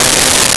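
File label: Baritone Sax C3; Nintendo; July 2020 Gigaleak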